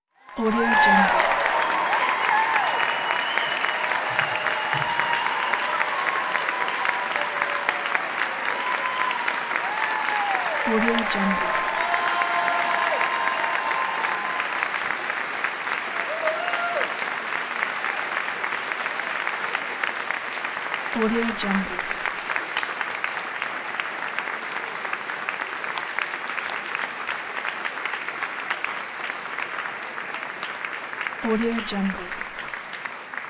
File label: applause cheering